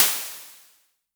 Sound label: Crashes; LIMAT Div Dept; Drums